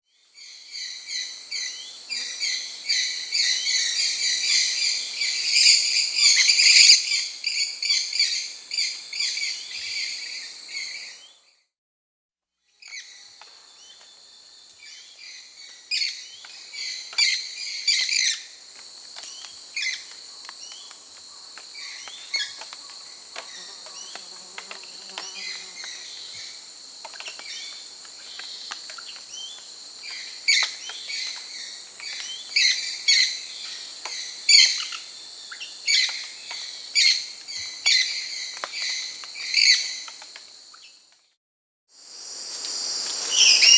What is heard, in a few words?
Black-capped Parakeet, Pyrrhura, Nature's Songs, S. America; Pyrrhura rupi